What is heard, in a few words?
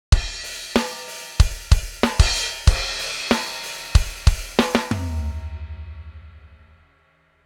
Loop